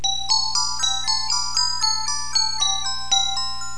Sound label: musical box